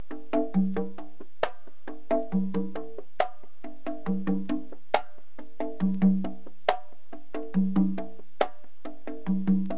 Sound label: musical; GRSITES Graphics